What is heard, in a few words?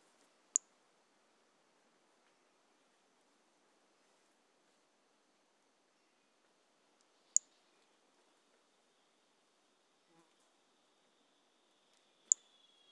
Brachycephalus mirissimus; A E Confetti